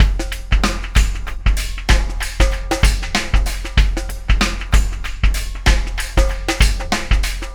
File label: Acoustic Drumloop